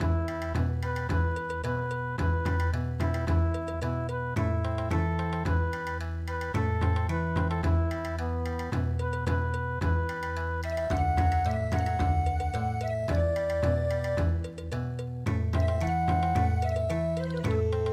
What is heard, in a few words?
Dodoitsu Houchoshi Bon Odori